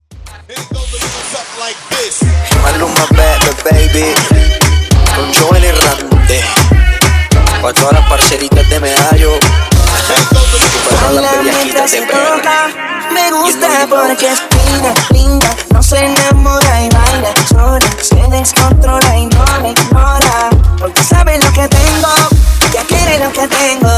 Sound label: Moombah Hype In Outro 100 BPM